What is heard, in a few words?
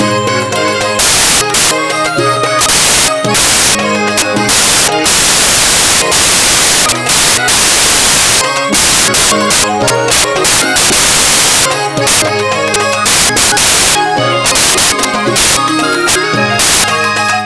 pop, bgm; pictcode